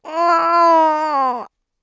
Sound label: Moaning, Child; de